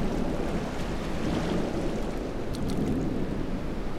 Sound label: lake waves